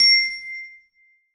glockenspiel c5; Percussion; Sonatina Symphonic Orchestra

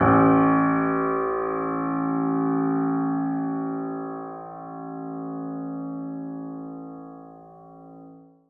piano f a1, Grand Piano; Sonatina Symphonic Orchestra

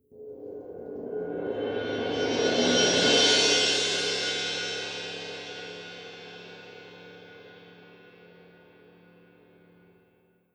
cymbal roll p; Percussion; Sonatina Symphonic Orchestra

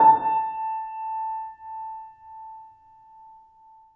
piano p a5, Grand Piano; Sonatina Symphonic Orchestra

